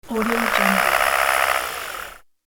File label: motor small low speed purr; hollywoodedge